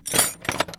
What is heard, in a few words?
toolbox; snds jack gmod